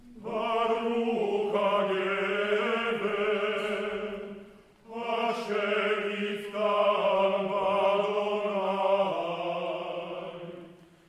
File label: ensemble vocal; baroukh haguever